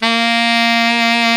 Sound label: baritone A#2, BARITONE SAX; InVision Interactive; Lightware VOL-1; Instruments & Percussions